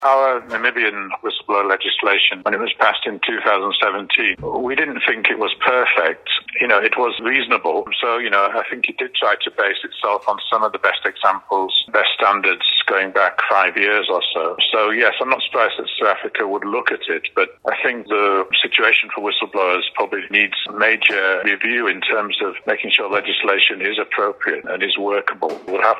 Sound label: whistle; ENG